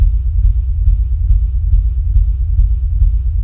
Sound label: reelspin bd; superstriker